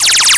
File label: alien gun; Web Clip Empire 150,000